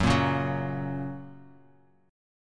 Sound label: F sharp Major, F sharp, chord; strummed; JGuitar